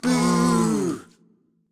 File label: Crowd Boo; Pitch Black Audio Pong